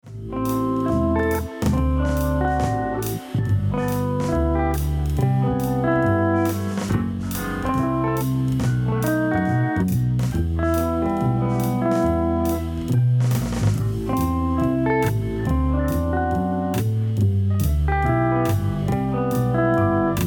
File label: Jazz Chords